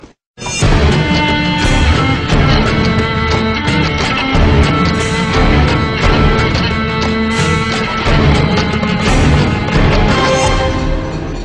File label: Victory Song; Cod4